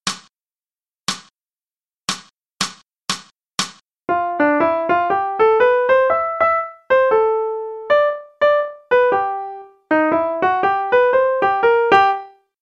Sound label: Transcribing Rhythms; swinging